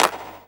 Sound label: clap, hip; Best Service; Dance Mega Drums